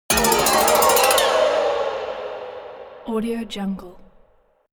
musical horror haunted strings weird stroke ricochet